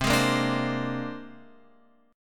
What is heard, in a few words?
C 7th Suspended 2nd Sharp 5th C down Guitar Open F; C7sus2#5 strummed; JGuitar